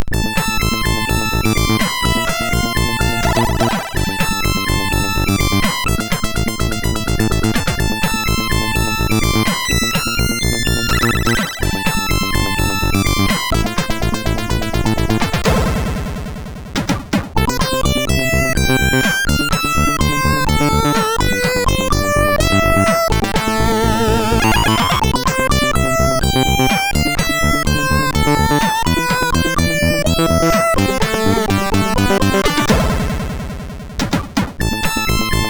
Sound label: Test; JCH; MOS6581R4